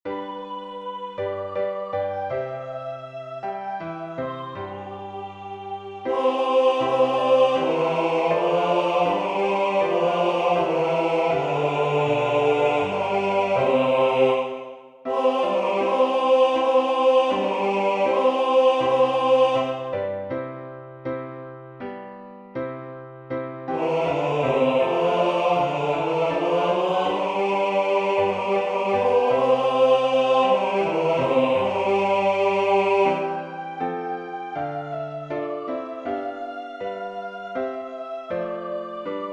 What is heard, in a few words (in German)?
Bass hervorgehoben Normaltempo; Armide est encor